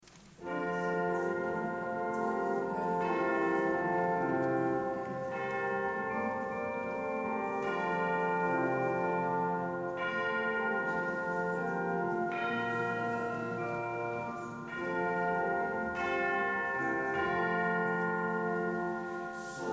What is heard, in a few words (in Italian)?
Dolci campane a natale